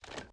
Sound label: Inspect, weapons; odin